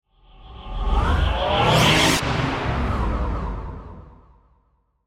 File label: Warp Dimensional, Scifi, Science Fiction; BlastWave